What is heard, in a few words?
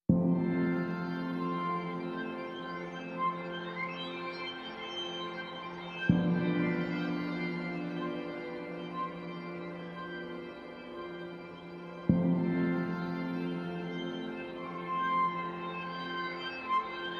Pads